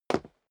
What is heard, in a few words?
step sneaker wood parquet single stair down; schritte